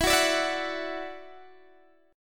E 7th Suspended 2nd, down, Guitar, DADGAD; E7sus2; strummed

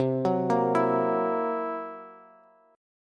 C 7th Flat 5th C down Bass; C7b5 arpeggiated; JGuitar

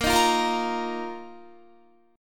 A sharp Suspended 4th Guitar; A#sus4 strummed; 18,18,15,15,17,17

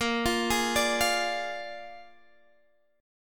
A sharp 7th A sharp, Guitar, Drop D

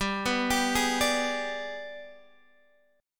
Ab Minor Major 7th Flat 5th, G

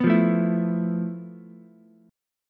F Major 7th Flat 5th Ukulele; strummed; JGuitar

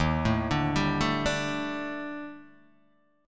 Eb Major 7th Flat 5th Eb Guitar DADGAD

